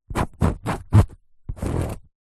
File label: office pen ball point draw diagram cardboard sheet